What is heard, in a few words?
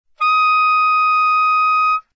F6, soprano saxophone